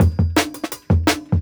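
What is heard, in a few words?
Jungle Breaks, 170 bpm; Original Jungle Breaks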